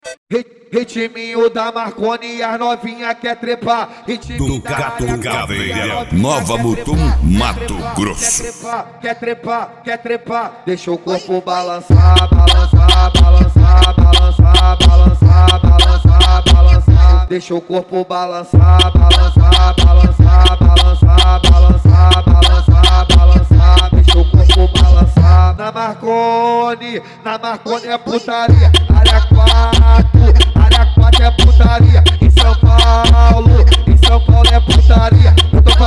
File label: funk mega bass